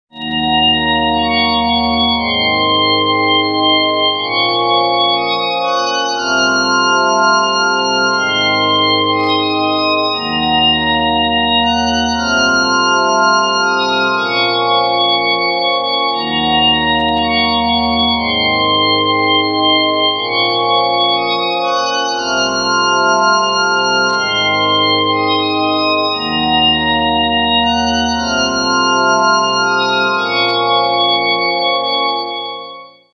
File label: korg z1; synth